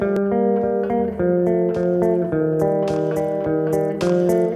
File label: song; kz-endo slide svn muffinslides